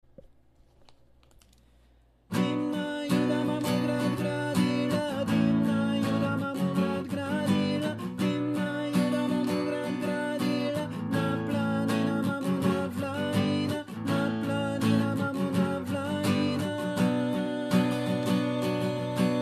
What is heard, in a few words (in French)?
Voix aigue; Dimna Juda